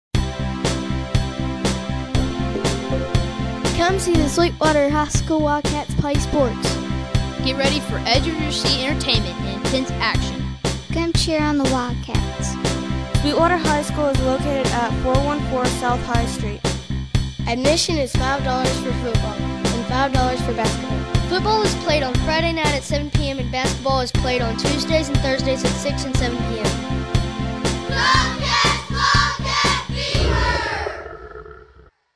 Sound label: Sports Jingle; Sweetwater MS